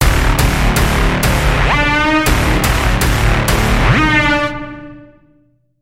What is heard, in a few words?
Braam; Routed Sound; Serum 2 Expansion - Quantum Enforcer Vol.1